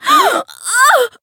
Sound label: Voice damaged; bivette